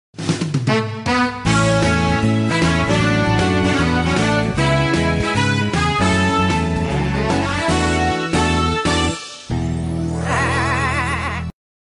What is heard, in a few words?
TUNE RINGTONE; MRS BROWNS BOYS